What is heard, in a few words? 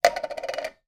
foley food tin can empty down on wooden surface